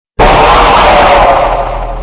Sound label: jumpscare sound; fnas 5